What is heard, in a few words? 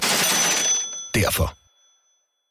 Ding